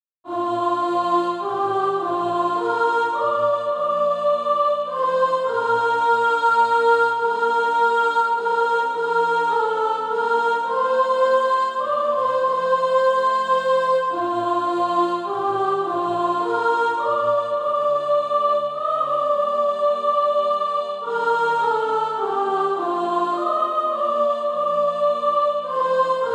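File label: soprano, Practise Music; Father in Thy Presence Now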